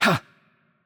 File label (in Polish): Attack Light; VO; pl PL; bloom minigame